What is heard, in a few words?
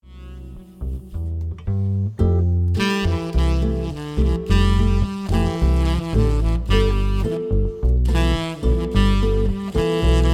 trio